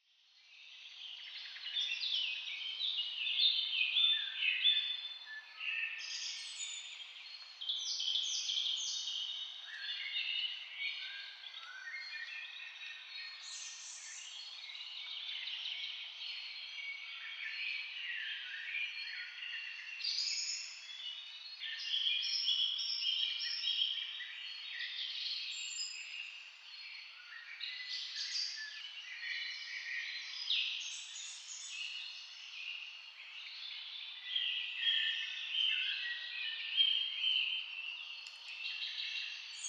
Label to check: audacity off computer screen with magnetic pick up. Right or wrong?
Wrong